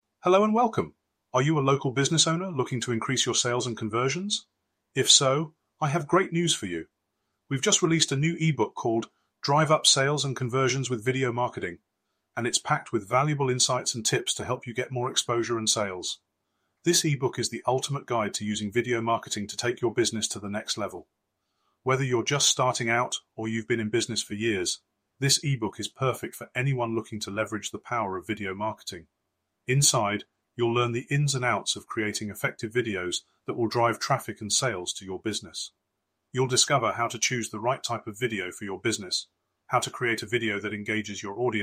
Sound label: synthesized audio